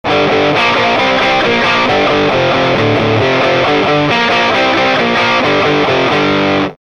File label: Fast Riffs, Guitar Effects Pedals; Pro Co